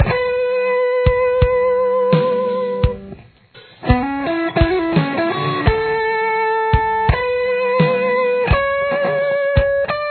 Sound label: lead, guitar